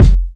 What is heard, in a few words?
dope bass drum f key, Royality free bass drum tuned to the F note. Loudest frequency: 150Hz; kick drum samples